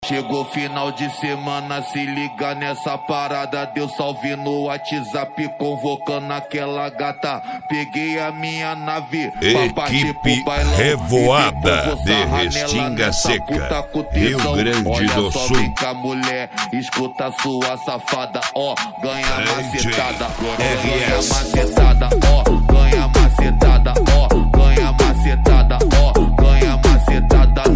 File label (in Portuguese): eletrofunk; cd equipe revoada especial fim de ano